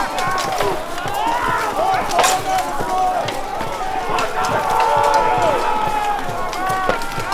BATTLE Medieval Reinact Footsoldiers Knights loop stereo